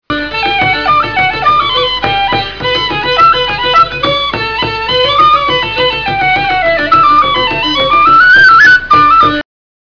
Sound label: hornpipe